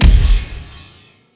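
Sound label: Laser gun Flange Cannon Fire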